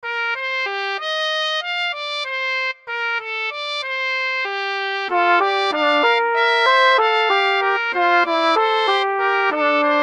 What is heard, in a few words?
fugue